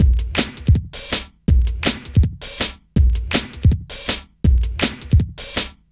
162, breaks; M8

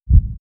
Sub Beat, perc fx; X-Static Goldmine

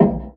Hollow Kick; Kick Snare Layers; Drum Bits; layering

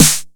909 SNARE, Elec. Percussion

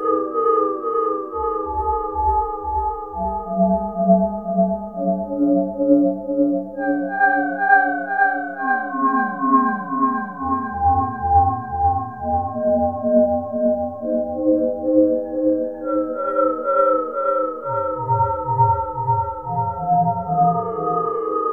AMBI BELL, BELL ATMOS; Zero-G Ambient 1

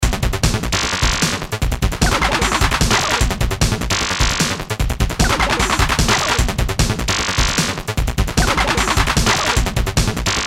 Sparks, zaps, industrial

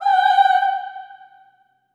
Staccato F G5 L, Symphony of Voices; Spectrasonics

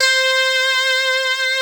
CLAV LEAD C4, Analog Fantasies; ARP 03; USB Soundscan vol.49; AKAI

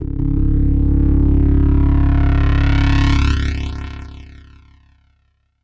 Phone Bass, Bass One-Shots, Drum n Bass; KJ Sawka - Drum n Bass & Beyond

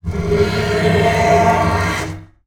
TALK BOW, BASS FINGER; Xsample VOL-5

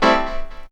P. CHORDS, KEYS

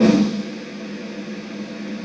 snare space; E-MU Formula 4000 Series Vol. 2; OrbitPercMaster

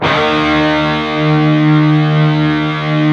PRS, Guitars, PWR SLID

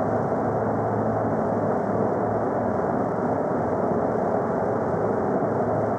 Panned Organ, non tempo loops; stereo toolkit samples

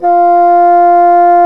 Orchestral Winds Bassoon; Roland LCDP04; 90 s Sample CDs; Bassoons

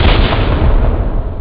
Jungle impact; jazz jackrabbit 2

